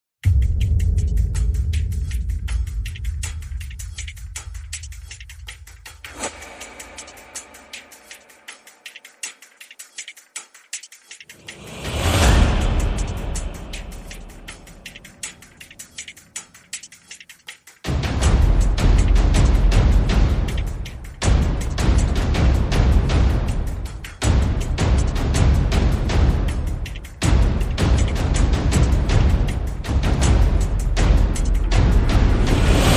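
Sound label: Percussion and FX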